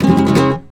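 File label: RASGAND D minor, FLAMENCO Guitars; Spectrasonics; Hans Zimmer Guitars VOL-2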